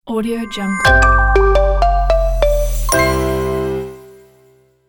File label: positive confident logo